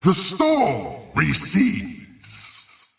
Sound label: teleport pain; razor